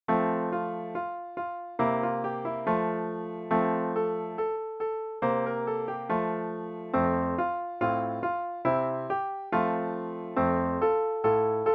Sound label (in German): siebenschritt mit melodie